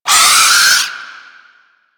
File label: Arm Vocal Wind Up; Warframe; Man In The Wall